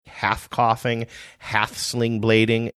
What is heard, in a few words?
half coughing; half slingblading